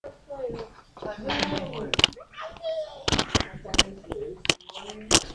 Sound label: noise